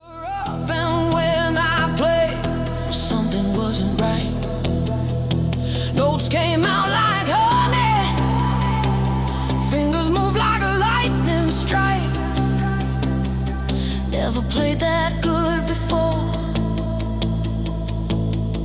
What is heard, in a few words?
hardstyle remix